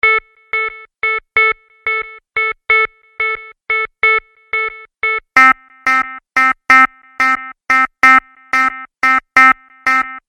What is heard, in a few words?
oppo starter ringtone